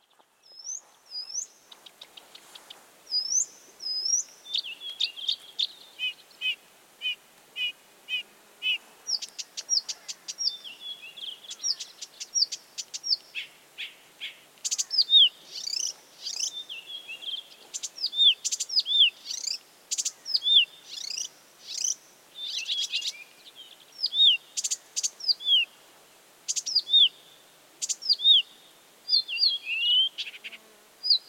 busksångare; fågelljud

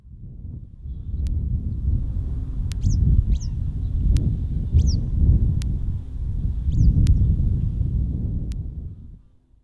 gulärla lock; fågelljud

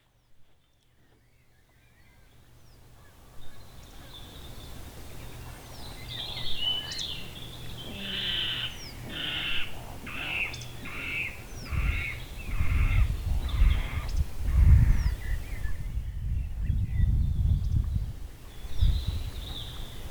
vattenrall; fågelljud

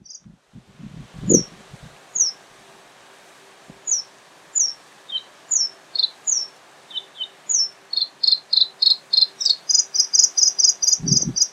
blåhake; fågelljud

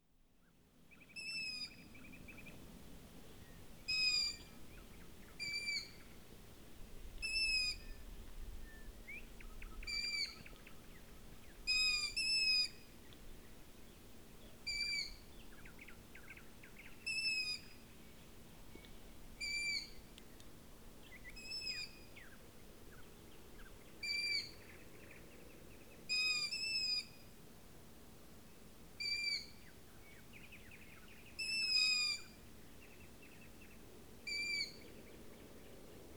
hornuggleungar; fågelljud

